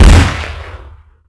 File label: glock shoot; Daikatana